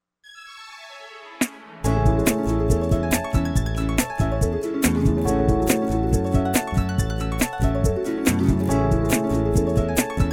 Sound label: Lounge